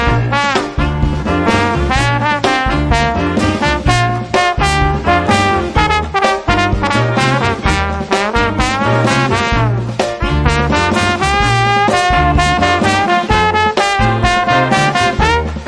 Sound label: new orleans blues; dixipedia